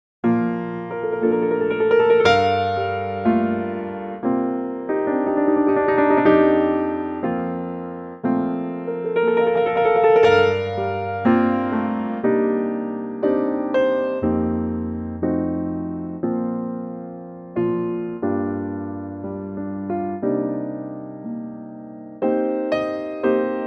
piano Bb; key - Bb - vocal range - F to Bb

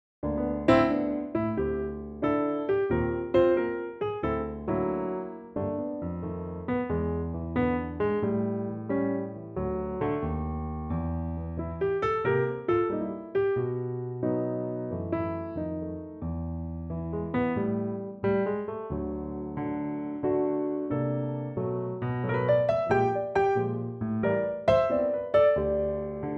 G piano; Pianotrak; key - G - vocal range - D to F; Fab Piano only arrangement; great tempo and lovely playing!

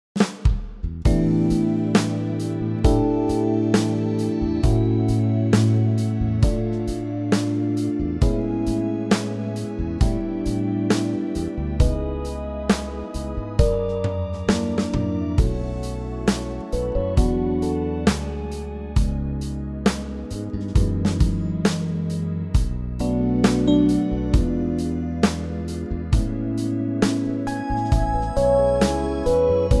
A smooth

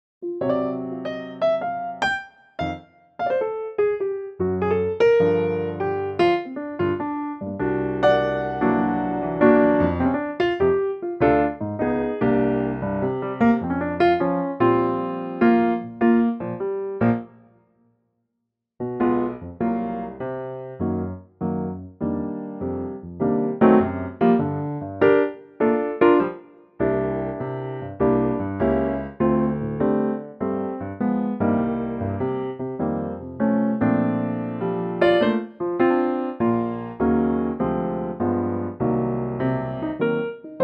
Bb Piano

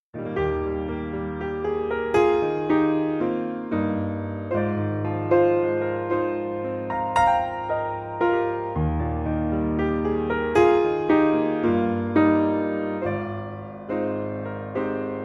Eb piano; Pianotrak; key - Eb - vocal range - C to Eb; Very lovely, piano only arrangement

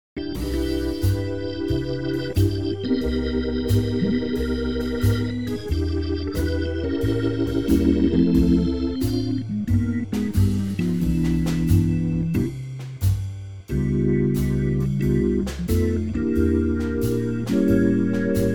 organ C; Organ Trio; key - C; range - D to E; Superb Hammond organ Trio arrangement of the classic standard, not too slow

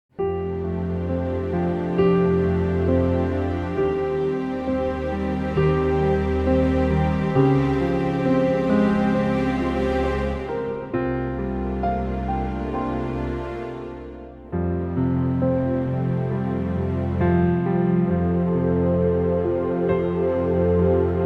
orchestral F; key - F - vocal range - F to A; Beautifully subtle piano and orchestral chords, staying in tempo but with orchestral movement

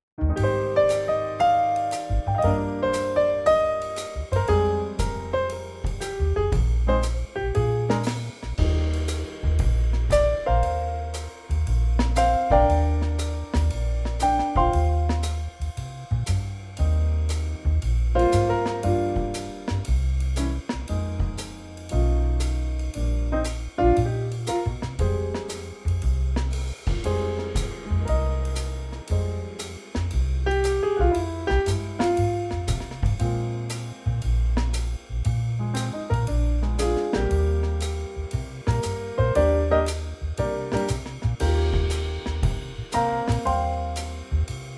Eb swing

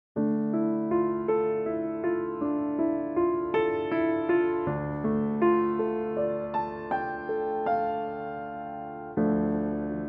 Dm piano; Pianotrak; key - Dm to Ebm - vocal range - A to Bb; 3/4 ballad

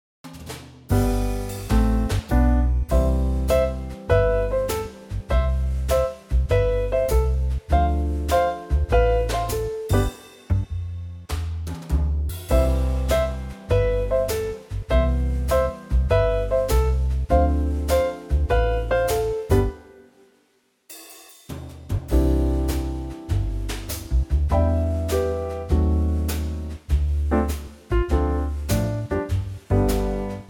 C Trio; key - C - vocal range - G to C

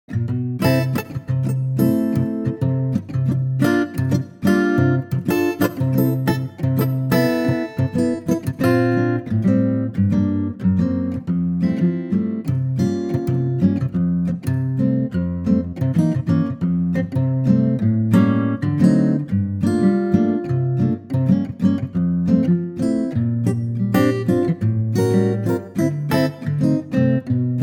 F latin ac gtr; Acoustic Guitar; key - F - vocal range - C to E; Stunning acoustic guitar track, in a double tempo latin feel

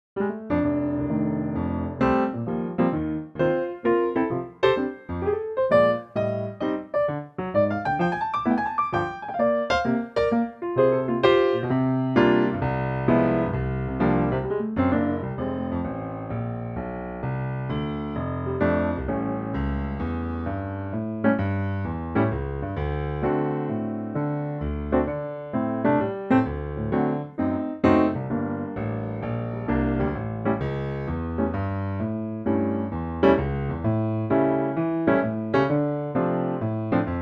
F piano; Pianotrak; key - F; vocal range - G to A; Superb and swinging second piano only arrangement

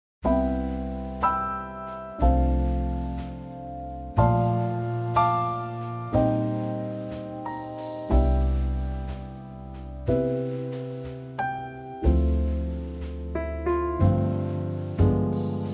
C trio

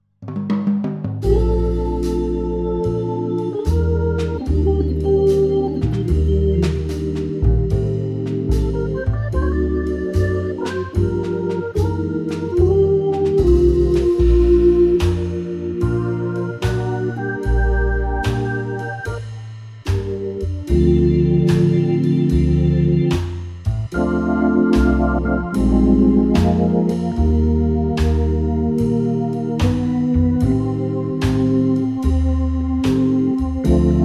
Bb organ trio; key - Bb - vocal range - C to D

